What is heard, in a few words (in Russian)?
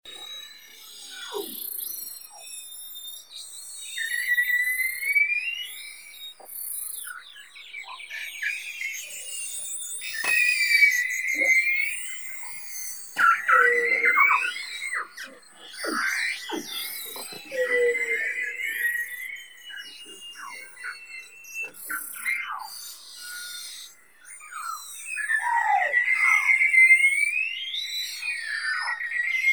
beluha ili belii kit delphinapterus leucas